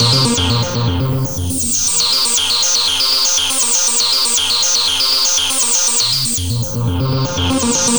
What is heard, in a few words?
Synth Arpeggio, Synths; Eclectic Electronica